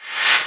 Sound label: Reverse FX